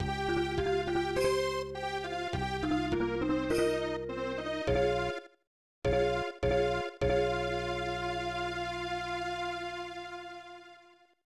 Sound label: Unused Jingle; Taikyoku Igo Idaten; SNES